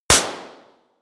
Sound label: snap